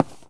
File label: Footstep Concrete Run; Legend of Keepers